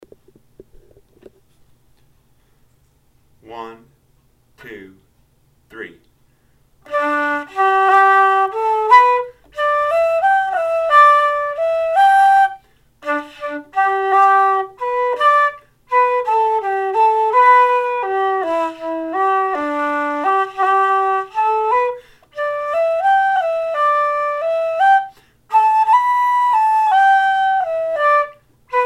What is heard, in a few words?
slow; Boys of Ballisadare